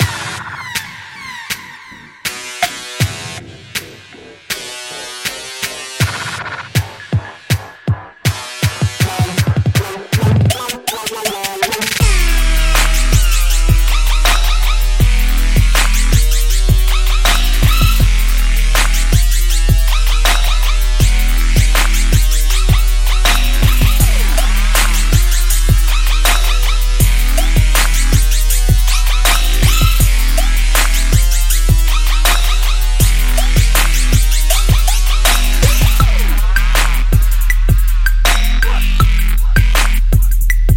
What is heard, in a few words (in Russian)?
muzyka dlya instagram